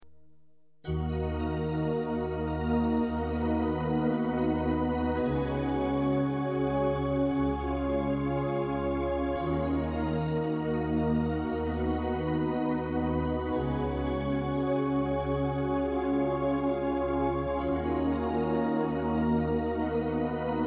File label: new age